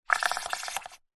foley liquid water air bubbles surface shallow short